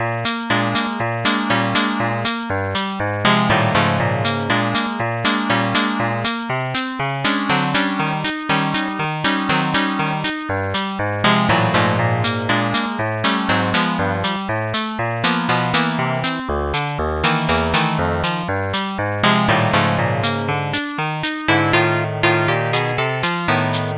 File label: blues; Sounds Terrific CD; Weird Science; Amiga